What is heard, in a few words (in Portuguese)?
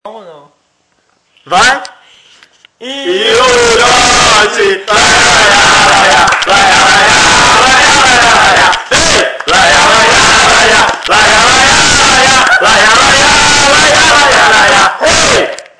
grito; joti 2006